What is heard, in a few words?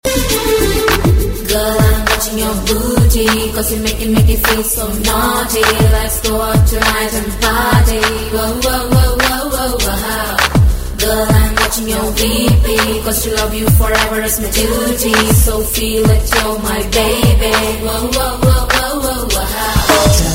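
background music Humming Ringtone; Pokiri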